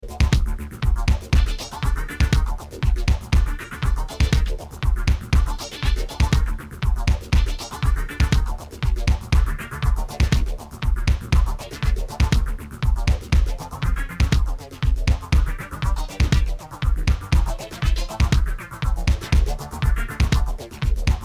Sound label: Korg R3; Black Box